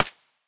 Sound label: mp5 silencer mono distant